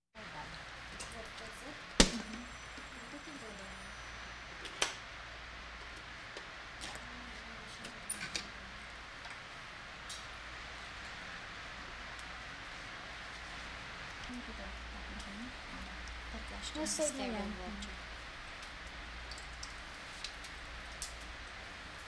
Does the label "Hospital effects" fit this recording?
Yes